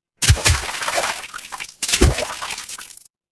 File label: water splash